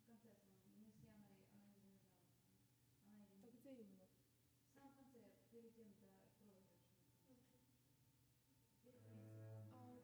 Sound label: Piano